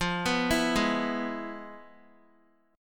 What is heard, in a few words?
F Minor Major 7th Flat 5th Guitar; FmM7b5 arpeggiated; JGuitar